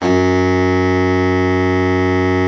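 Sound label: BARITON, baritone sax; Best Service; Brass Super Section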